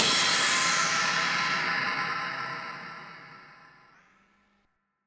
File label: Crash, impact samples